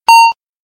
1 beep ring; meloboom

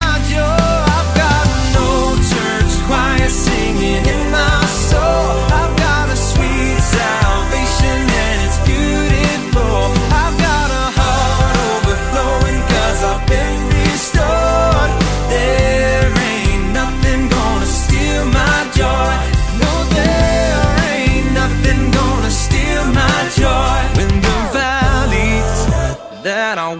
Church Choir

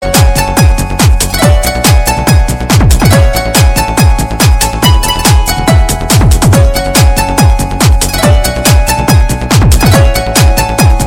Fast Beat; Manmadhan

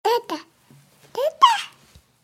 Cute Baby

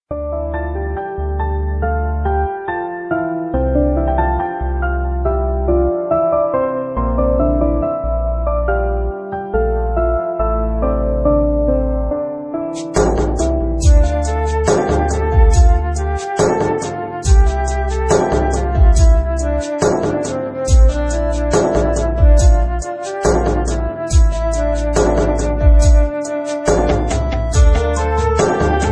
Sad Piano

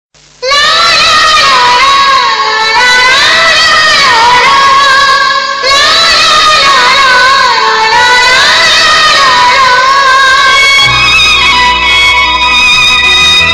Theme Tone

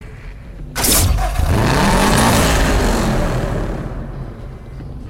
Subaru Wrx Sti Sound

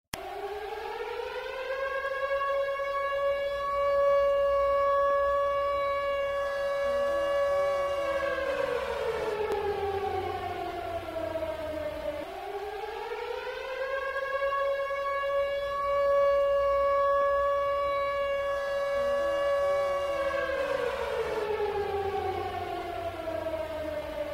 Nuclear Alarm

